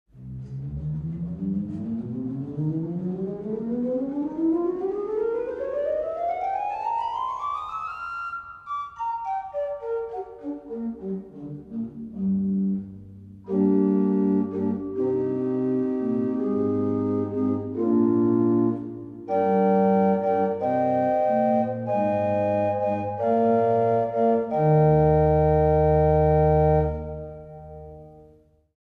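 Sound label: Hohlflöte 8, orgel; Schultheis Schlimbach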